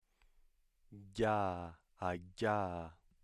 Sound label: voiced palatal stop